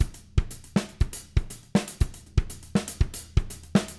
120, Living Drums, CARIBE; Sampleheads; Peter Erskine Living Drums

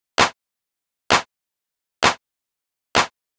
Clap, EDM; Sonic Foundry (Sony Creative Software); Afterhours EDM

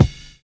SNAP N CL; Univers Sons - Kitch 70s

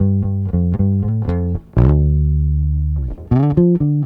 Bass, 70s Analog Funk

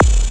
BD, Dance Mega Drums, BD HOU; Best Service

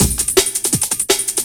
Angles Break, Loops; m8 breaks

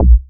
Bass B1, club techno

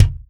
Real Drum Kit, ROCK KIT

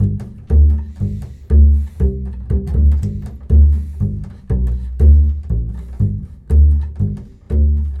Bull Fiddle, Appalachian

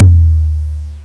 Bass TOM 100Hz; Urban Shakedown

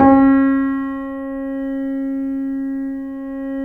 C7 PP, The Ultimate Piano Collection; East West

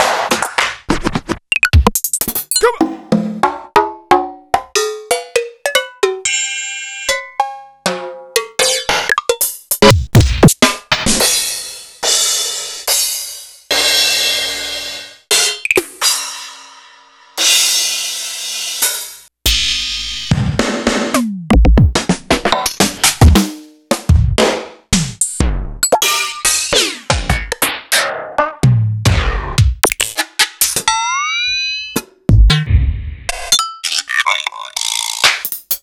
EMU Proteus-2000

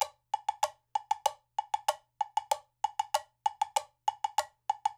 NYC Percussionworks; Sampleheads; GJ LOOPS 96

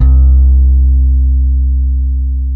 BASS, C2; Zero-G; Chemical Beats; BASSES

